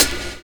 HIP SAX; Univers Sons

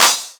Claps; Vengeance - Essential Clubsounds Vol.5

